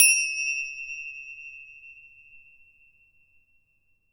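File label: KETJER CYMBAL, ethnic percussion; WorldSounds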